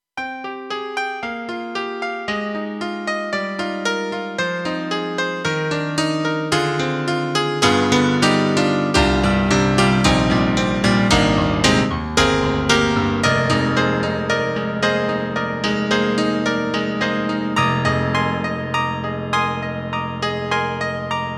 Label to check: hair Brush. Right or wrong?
Wrong